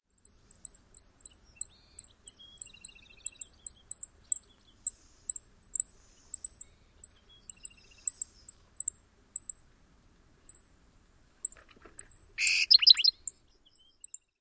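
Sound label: Black-throated Sparrow chip calls; AZ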